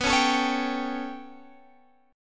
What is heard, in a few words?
B 7th Suspended 2nd Sharp 5th B, Guitar, Standard; B7sus2#5 strummed; chord shape; 19,16,19,18,20,x; JGuitar